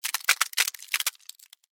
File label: Ice Crack; Weather; Snow Sound Effects; Snow (Sound Effects:Weather)